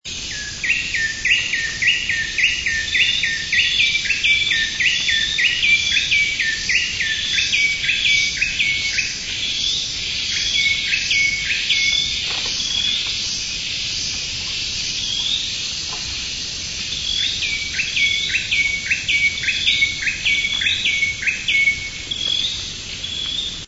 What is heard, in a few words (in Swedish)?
niah natur; borneo